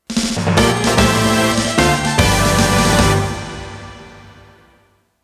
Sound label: Fanfare, soundtrack; TwinBee RPG